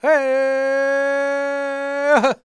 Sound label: powwow, World Voices, Lakota; Spectrasonics Vocal Planet CD5